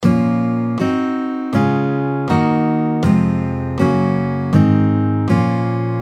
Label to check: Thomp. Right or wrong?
Wrong